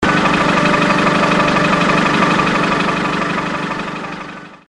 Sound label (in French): Un bruit de moteur; Amour - ÂNES ET BATEAUX (PoNa 25); les potagers natures